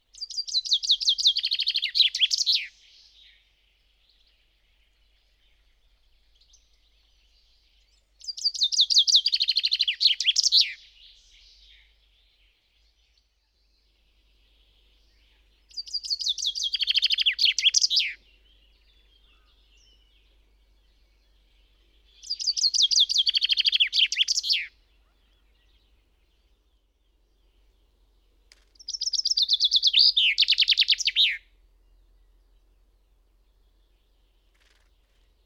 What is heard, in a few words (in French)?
pinson des arbres; oiseaux de nos forets